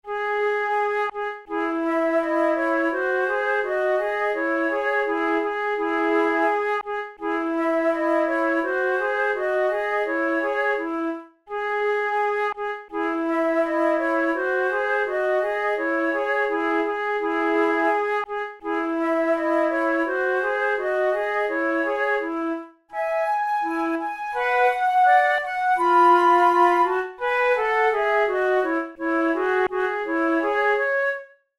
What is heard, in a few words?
methode de flute; tulou